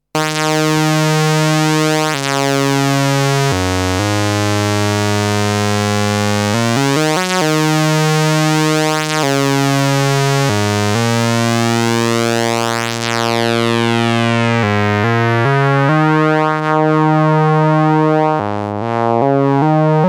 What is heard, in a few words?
dry SAW